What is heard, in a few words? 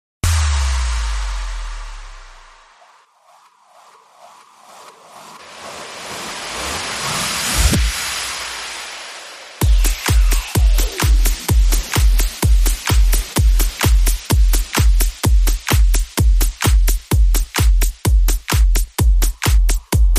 Beat